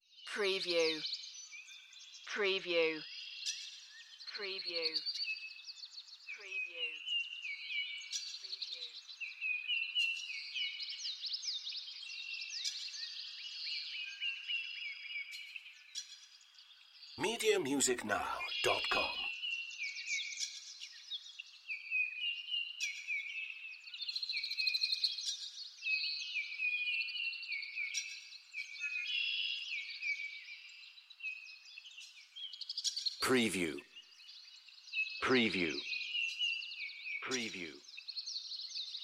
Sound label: Nature Ambience Birds Farm Morning